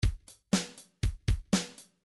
midi drums drums 8 beat

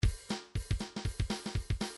midi grooves pop

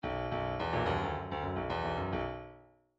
midi grooves funk

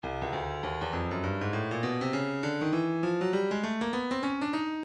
breakbeat midi 100 bpm; Stylus

